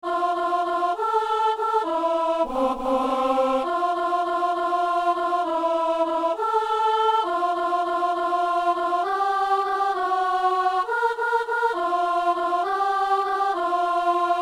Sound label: alto; Messe coutrillonne - Alleluia